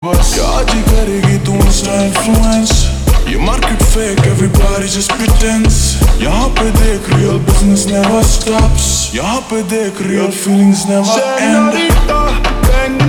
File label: Ringtone Slowed Reverb